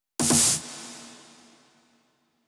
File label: PWM Kit 142 BPM Roll Snare; Vengeance - Essential Clubsounds Vol.5; VEC5 Demo Constr Kits; Root Gmin; Drums & Perc